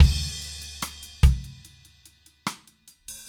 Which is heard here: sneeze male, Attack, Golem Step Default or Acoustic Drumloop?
Acoustic Drumloop